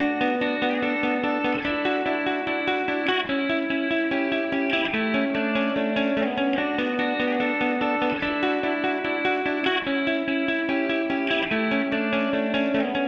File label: Guitar, 146bpm; Remix Stems